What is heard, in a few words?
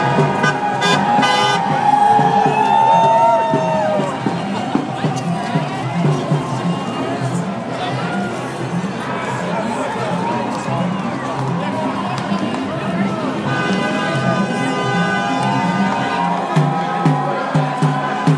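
oakland march sounds ensemble with car horn support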